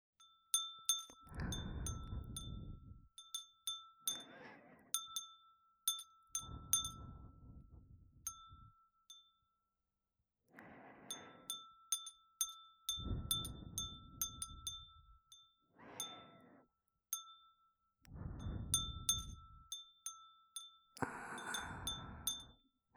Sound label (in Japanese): ガラスの風鈴 吐息, ASMR